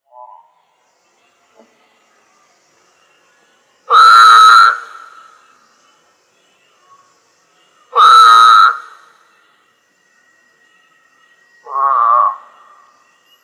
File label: Eastern Spadefoot; herps of NC; anurans